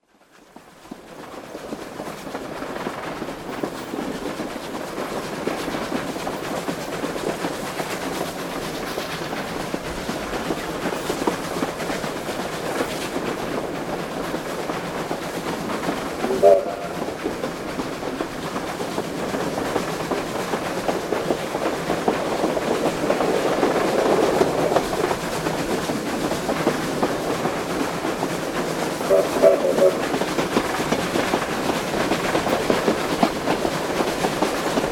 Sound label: on train